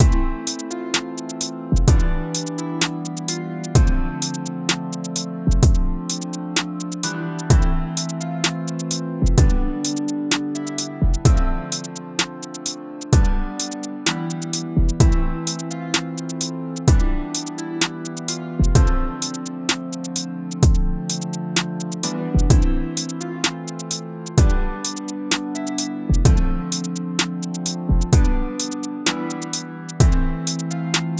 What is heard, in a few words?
Chill Waiting Room